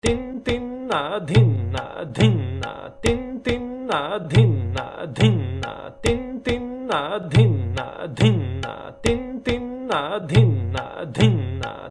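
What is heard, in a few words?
tala rupak, tabla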